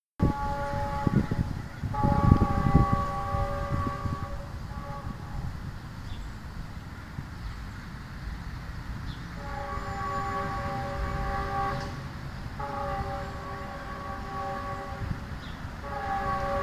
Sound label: park; morgan